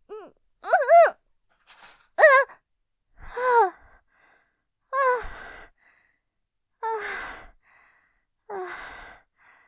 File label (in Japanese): 絶頂余韻 あぁ、あぁ、、ふぁあぁ…; 満足そうに膣内射精を感じて; ループで楽しむ！シェフの気まぐれ抜き抜きコース; モノラル